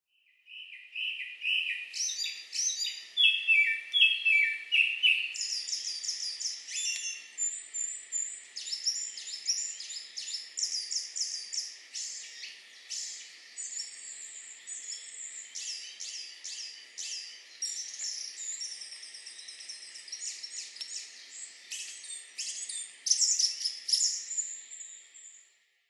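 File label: Japanese Thrush; kurotsugumi; nasushiobara; koe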